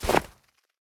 snow, step; Minecraft; Conquest Default

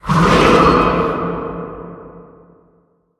charge, ghast; mob; Minecraft; Votive World

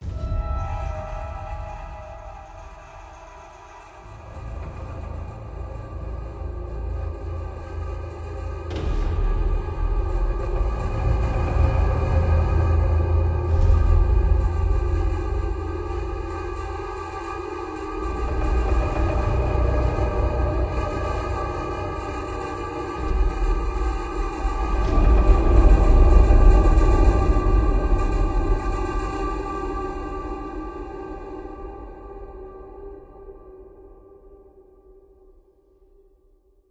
cave, ambient; Minecraft; Votive World

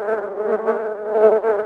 beehive work; Minecraft; Votive World

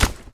hit, damage; Minecraft; Votive World

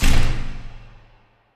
close, iron door; Minecraft; Votive World

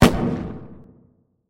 large blast far, fireworks; Minecraft; Conquest Default

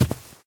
grass, step; Minecraft; Votive World

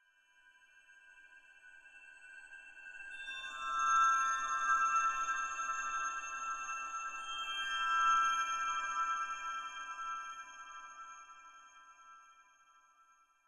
cave, ambient; Minecraft; Conquest Default

